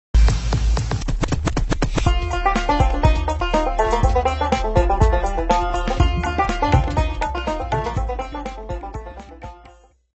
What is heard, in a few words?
outro